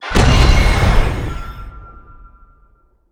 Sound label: Activate; smite; horus